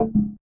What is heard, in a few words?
order scan